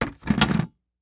Foley Plastic Bucket Set Open Side Down On Concrete